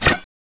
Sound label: Knife Attack